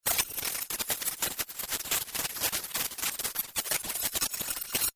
Electrical Static, Fuzzy, Stutter, Glitches; Production Element, Imaging Element, Accent, Transition

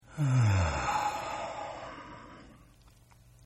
Sigh; Exhaling; Sound Effects:Voice; Partners In Rhyme

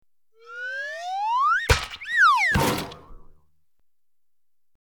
Cartoon -Slide Whistle Up, Bonk, And Down; Slide Whistle Glissando Up To Bonk , Then Gliss Down To Splat / Boing; Boings

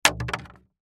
Milk Crate Drop, Milk Crate Drop Plastic; Food; Household; foley; Blastwave

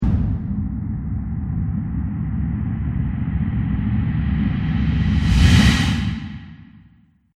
Cinematic Impact Then Whoosh; Trailer; Cinematic Impact Then Whoosh 01; Trailer Sound Effects

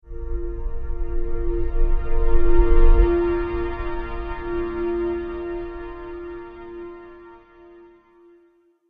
Ambient Trailer Whoosh; Whoosh Sound Effects

